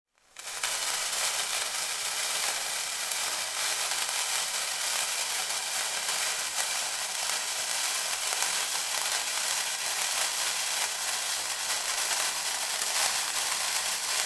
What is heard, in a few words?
firework sparkler; sound effects one